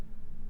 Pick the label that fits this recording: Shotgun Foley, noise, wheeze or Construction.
noise